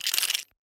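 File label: vibrating multiple click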